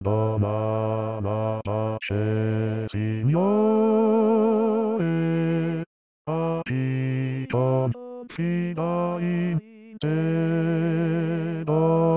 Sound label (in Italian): Bassi